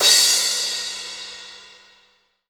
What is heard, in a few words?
subtle reverb drum crash a sharp key; cymbal drum samples